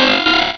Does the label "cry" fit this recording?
Yes